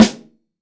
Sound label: METAL, LUDWIG SNARE